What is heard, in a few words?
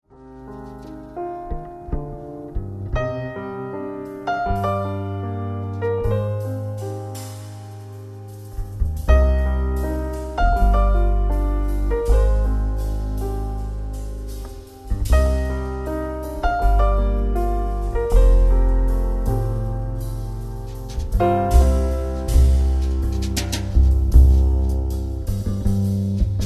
jazz kolo